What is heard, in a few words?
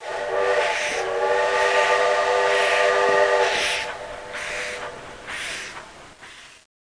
Choo Choo; HOLIDAY